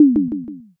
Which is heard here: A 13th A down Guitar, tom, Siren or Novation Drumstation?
tom